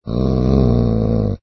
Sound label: ANIMAL DOG